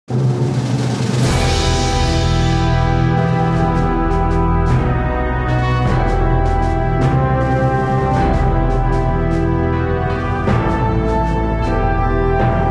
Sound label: Heroic Victory; warcraft